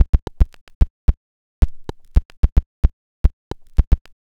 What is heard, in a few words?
Glitchy, Beats